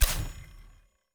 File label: spin Button Click; netent